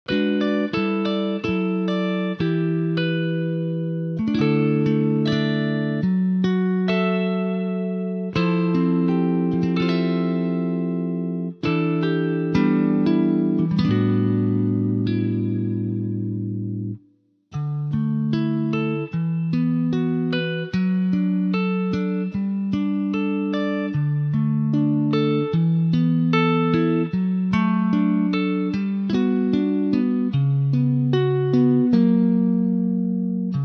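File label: D Sunburst guitar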